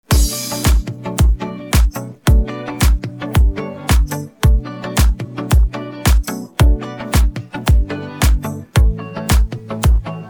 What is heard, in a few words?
instrumental; persian